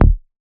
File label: Raw Bass E